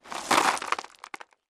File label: Landscape Rock, rocks & dirt